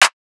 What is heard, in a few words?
Rim